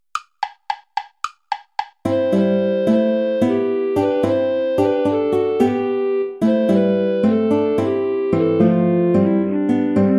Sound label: play along without guitar; hassler hans johann leo; ein feste burg ist unser gott